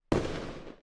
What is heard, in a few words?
pop bass